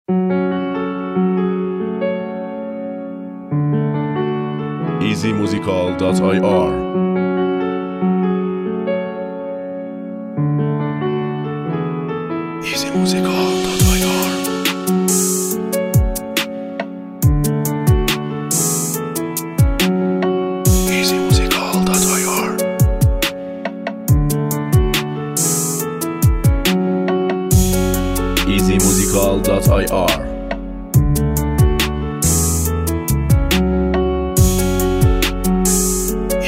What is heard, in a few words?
Beat